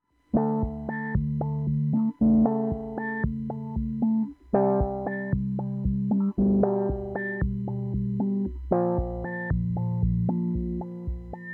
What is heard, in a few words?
wahoo lfo; Sonuus